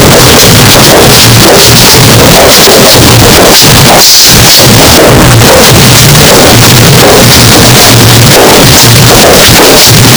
model walking on a runway

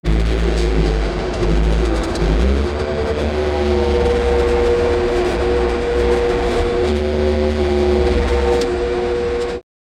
start of big drone with 8, propellers

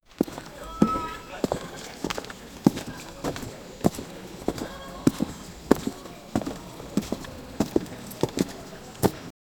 group of men walking, burial

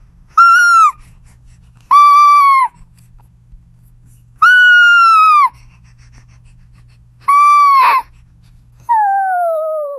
puppy sound

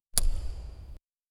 human-activity, sound-effects

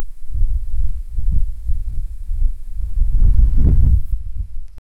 intense buzzing dry wind

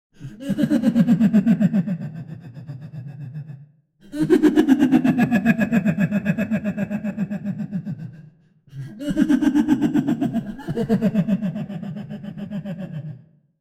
the sound of mad laughter

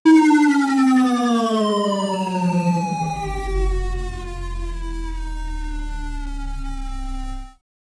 legendary item drop sound, angelic

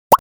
bubble bobble popping sound, cozy

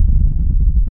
spiritual blast

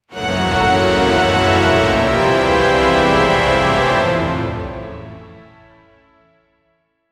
reach grand climax, taiko drums

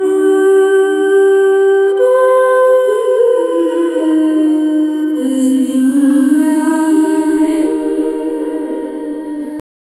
siren song alluring

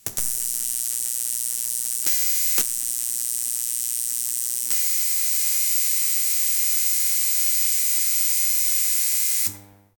electricity

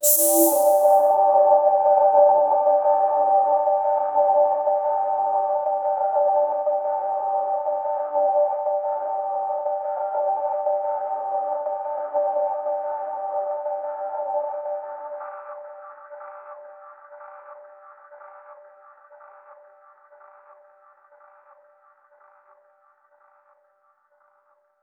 haptic vibration relaxing, smartphone

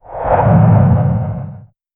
short expansion beam, science fiction

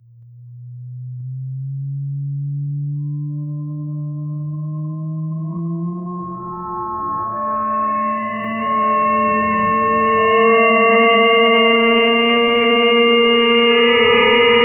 deep resonant, bellow

